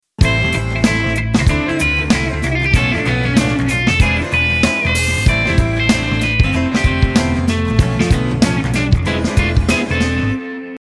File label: shuffle